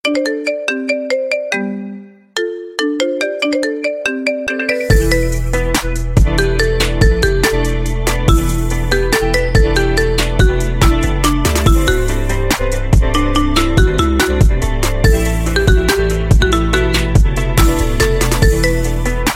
Marimba Remix Ringtone

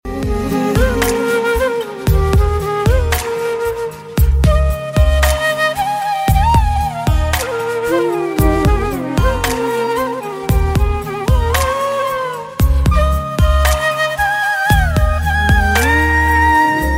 Flute Ringtone

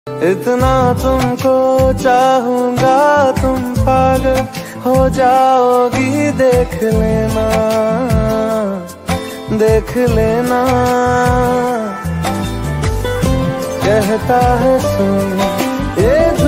Ringtone

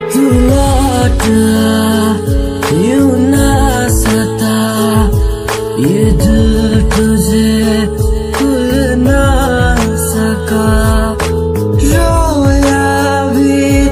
Ringtone

